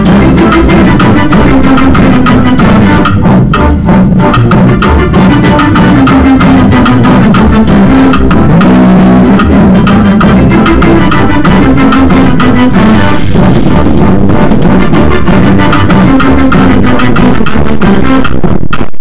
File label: bass boosted